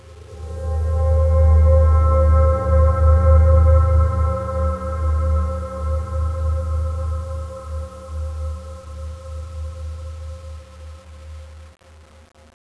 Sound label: strange; cstrike